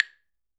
CLAVE; Sonic Reality; Interactive Drum Kits

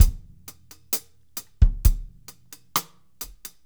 GRV, drum

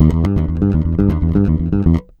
RUMBLE, Ultra Freakin' Bass, 112 BPM; Sampleheads

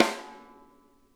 SNARE; Sonic Reality; Interactive Drum Kits

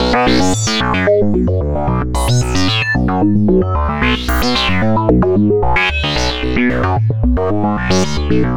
Synth Bass

